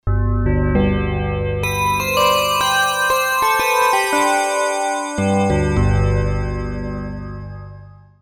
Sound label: Mansion Clock; FMagia; dinopark